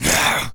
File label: Jump, Zombies; zps; Carrier